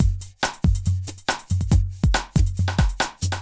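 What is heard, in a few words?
industrial 140 kit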